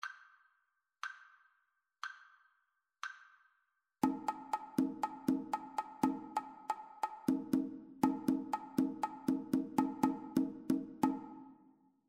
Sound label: two part rhythmic dictation